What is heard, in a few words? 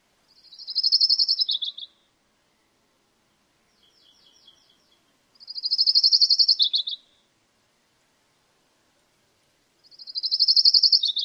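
songs, Colima Warbler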